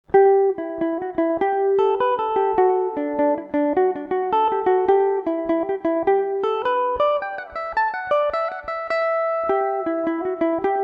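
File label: E minor, irish mandolin; two mile bridge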